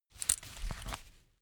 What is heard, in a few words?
leather belt open soft